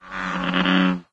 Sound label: bullfrog, frog groups; ambient; random animals; Left 4 Dead 2; Source Engine